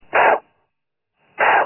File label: snort